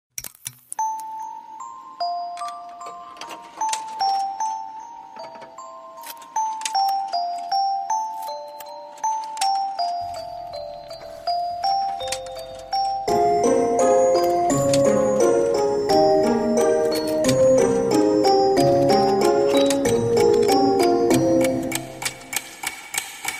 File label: Music Box